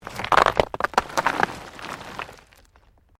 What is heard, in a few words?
STONES MOVE